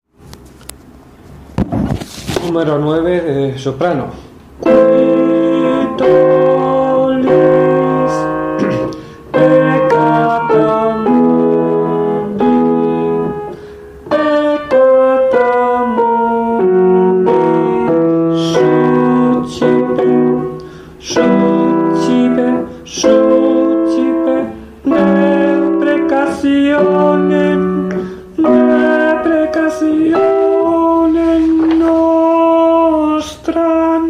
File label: soprano; Qui tolis